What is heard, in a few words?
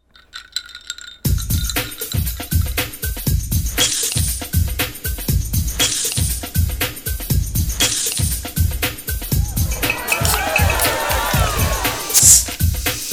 Hip Hop Mix